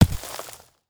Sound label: dirt hit; grass